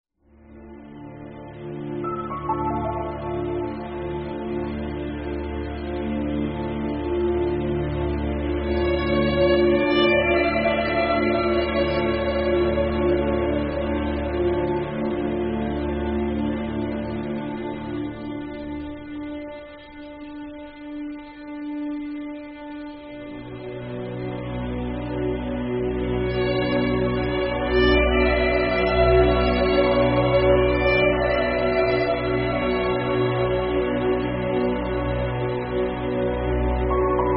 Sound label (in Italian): Un Violino E Un Pianoforte Insinuati